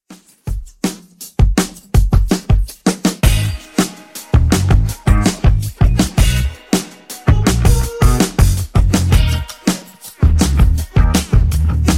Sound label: Funk Remix Clean 89 Bpm